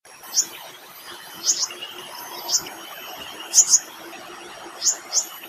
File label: Flowerpecker m calls; AmarSingh